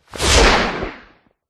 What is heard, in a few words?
caped superhero zoom past or impact cape flap fast